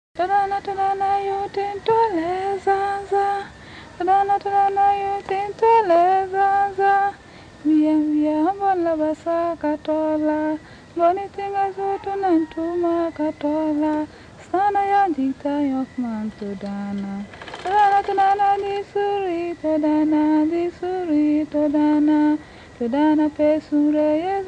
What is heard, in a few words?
Ghana Woman Sing Traditional Song